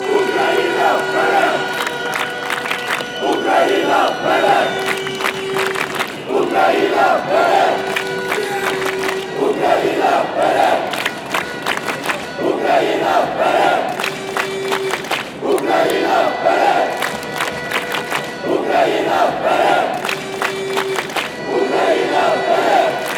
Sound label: ukraine chant